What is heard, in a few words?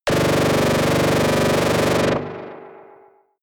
general ufo laser machine gun